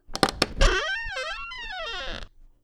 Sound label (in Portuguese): Abrindo Baú; Narrativa